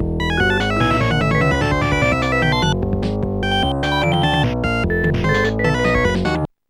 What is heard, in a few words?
rocking flight; Instant Music